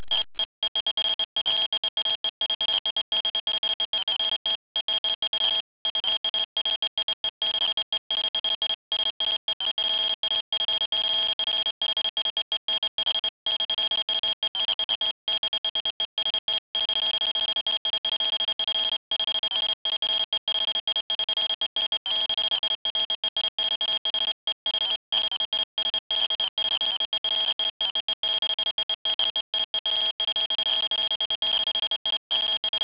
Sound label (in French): forte radioactivite, geiger; radiocol